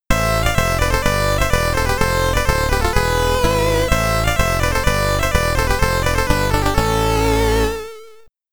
Stage Clear (SID Stereo); ramparts c64

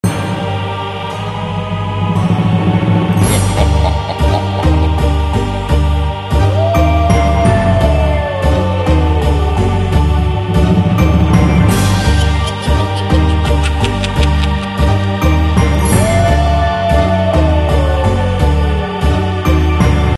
Music Pack; SpongeBob SquarePants Boo or Boom

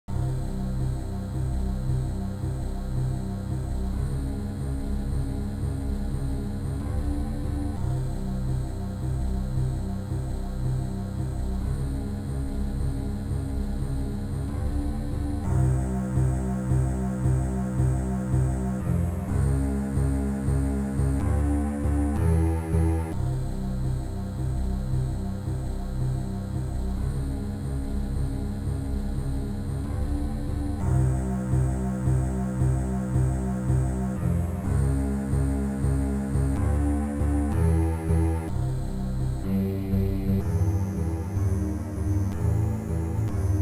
Atmosphere; Valhalla Before the War; amiga; Level 2

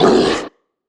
troll monster hurt pain

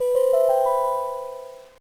jingle; Kawaii Pet Shop Monogatari 3; gba

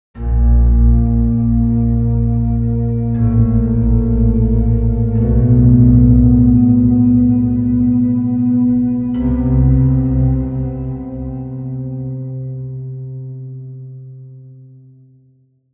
Dark piano Sample; The Sinking City

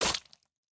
Squished Impact; Boomerang Fu

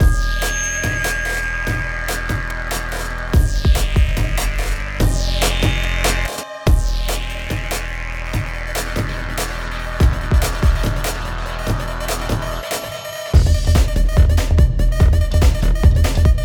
Music Fight; Sifu; H2 New Level Area=Dancefloor Flashkick

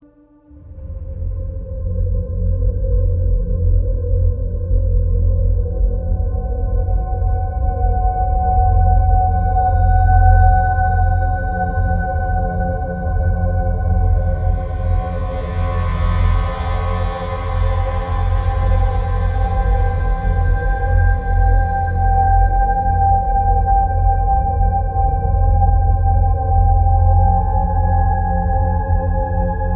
Global Conversation Spooky

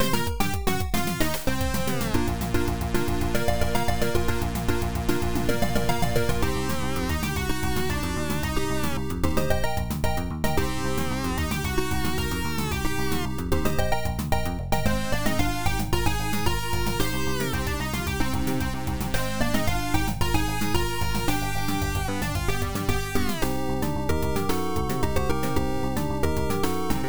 Battle; dragon slayer the legend of heroes pc 9801

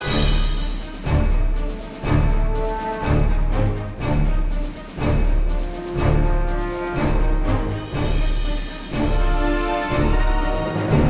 Combat; Church; Wolfenstein 2009 OST

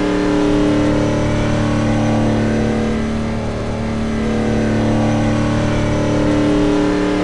Car Int Med; NASCAR Thunder 2003

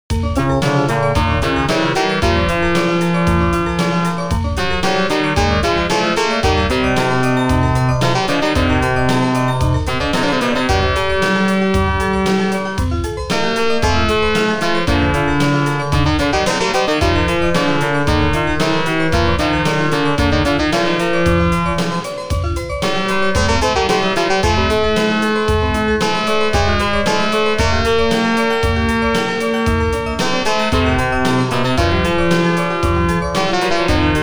Goal; Arcade Disc in Jaleco Variety

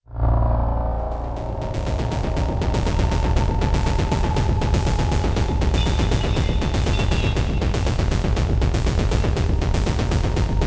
Music Fightpolice; 7th Sector; Windows

